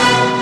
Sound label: Fight, SNES; Fight with Bad Bomber; Super Bomberman 5